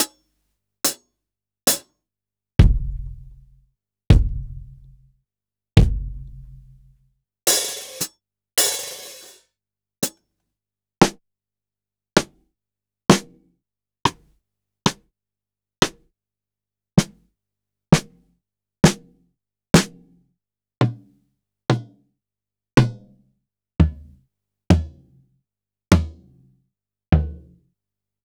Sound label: Acoustic, Kit; Sliced